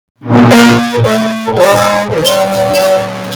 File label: super sad trombone